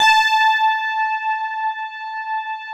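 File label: GLASS Rhodes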